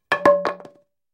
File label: Baseball Bat Wood Dr; baseball fx & games